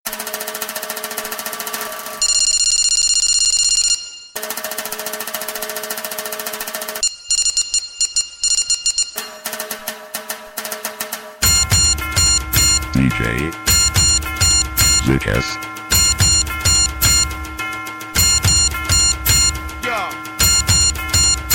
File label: Sms Dhol Remix